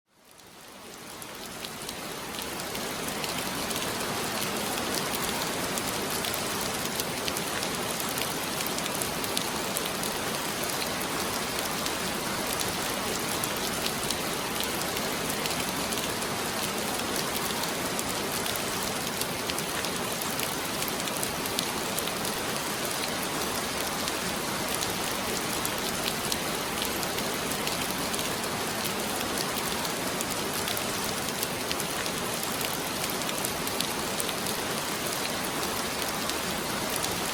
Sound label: heavy rain